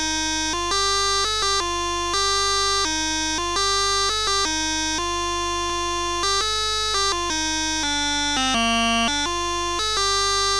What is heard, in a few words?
tune 65 bpm